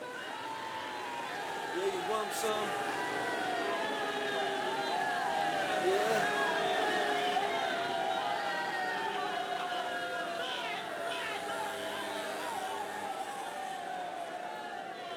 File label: Crowd Noises Jeering; Pitch Black Audio Pong